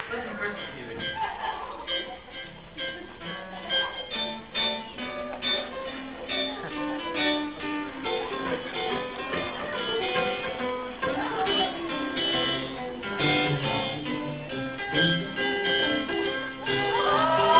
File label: instrumental